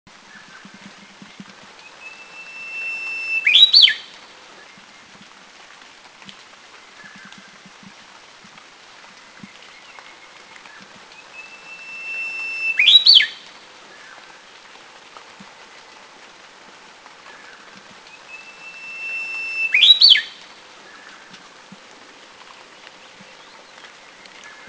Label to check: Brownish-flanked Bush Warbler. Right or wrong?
Right